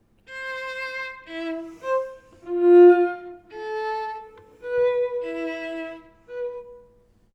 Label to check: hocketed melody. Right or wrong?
Right